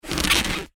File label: Foley Balloon Rub Short; Balloons; Cartoons